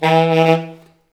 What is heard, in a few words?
SAX A. STAB, SAX Alto Section